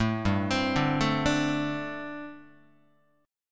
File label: G Suspended 2nd Suspended 4th, Guitar